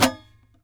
SAZ TAP; Spectrasonics; Hans Zimmer Guitars VOL-2

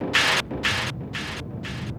Kaos FX, Ambient Grooves

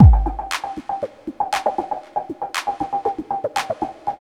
LOOP, Tekno Industrial; East West

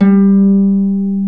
Harp G3; Mo' Phatt; Digital Sound Factory Mo' Phatt

